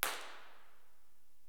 Fenice, IMP RESP

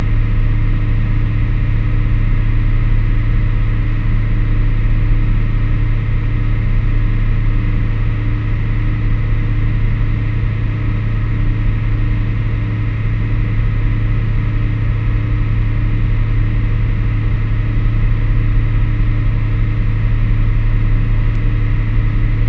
background

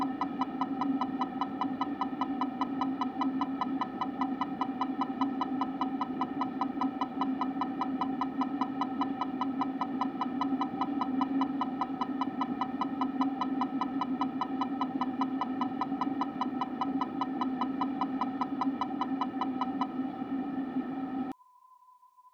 beep 16ms; CESI; LaSpezia

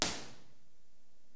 IR MLS, Impulse Response

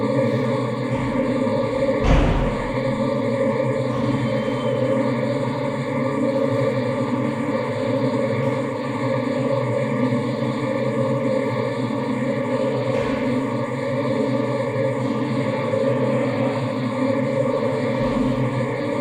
Signal Noise; Nevaton

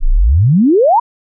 Sweep

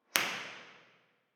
IR Sweep; Bformat

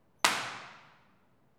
Clapping Machine

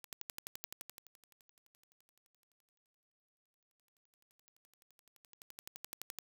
Turntable; rode; Rode-plugin-test

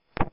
sweep 5s 500 5kHz; Hilbert; S1; Mic2 guida; 19.3V; CESI; LaSpezia

